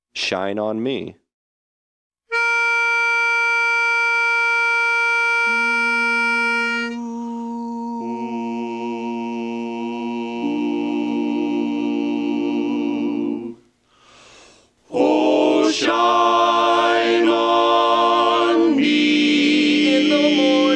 Tenor

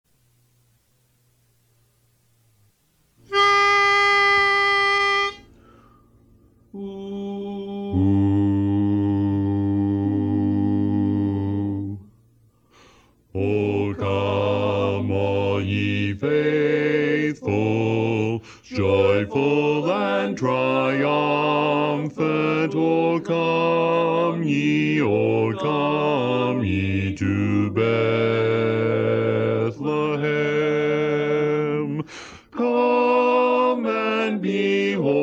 Bass

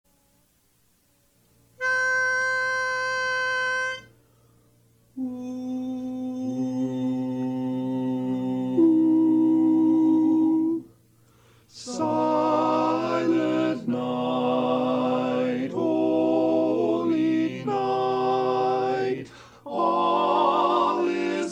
Tenor

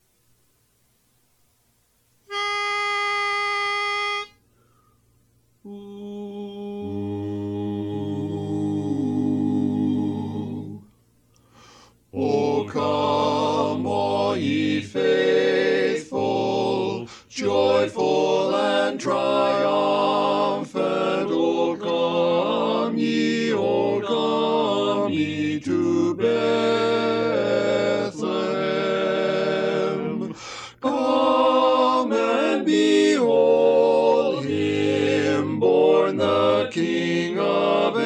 O Come All Ye Faithful Bari

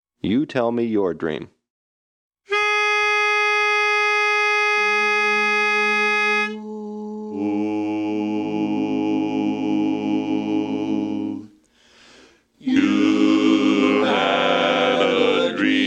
You Tell Me Your Dream Bass

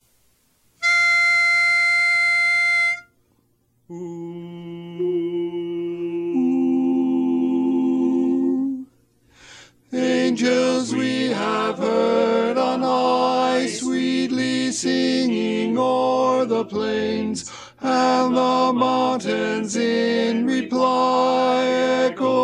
Angels Bari

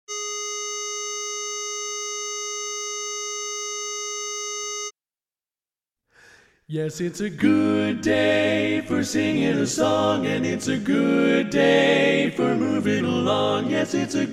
Tenor

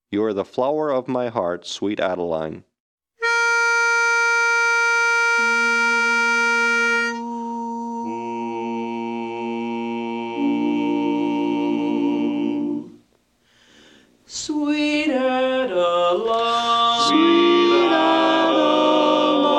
Tenor

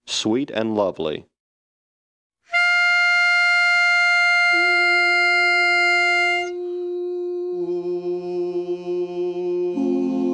Sweet and Lovely Lead

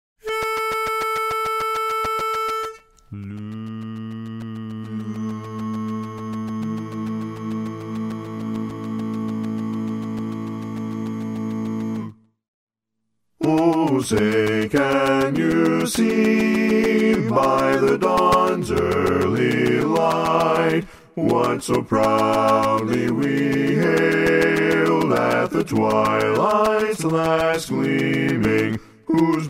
Star Spangled Banner Bass

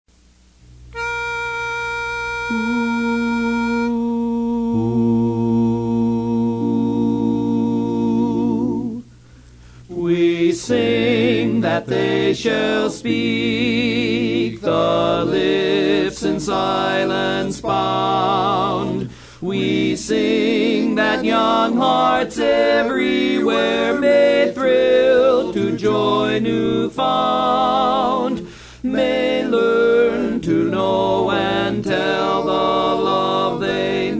lead

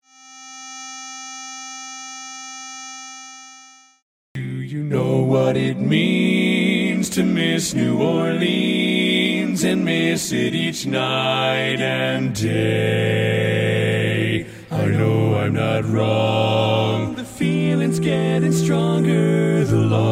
Bass

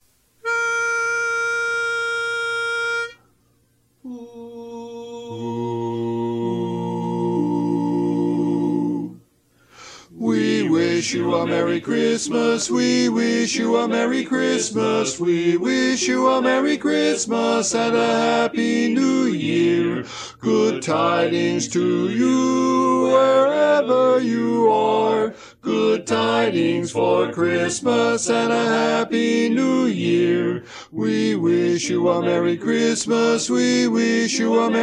We Wish Bari

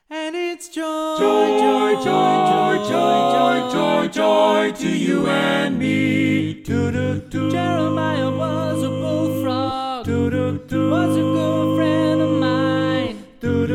Tenor

